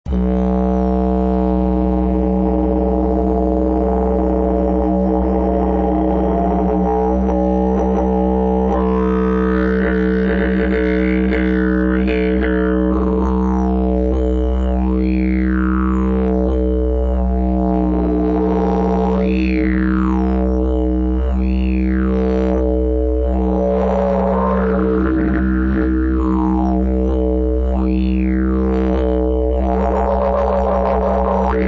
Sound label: glas, didge; Bell-End: 80 mm; Material: Glas, 3 mm dick; Gewicht: 1.5 kg; Tonart: B; Overblow: F#; Mundstück: Aus Glas geformt